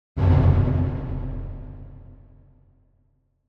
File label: Drum Deep Symphonic - Orchestra Drum Single Hit - Deep And Reverberant; Hollywood Edge; sound designer's toolkit v2